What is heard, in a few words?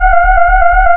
CONSOLE, SIGNALS; AKAI Anime Sound FX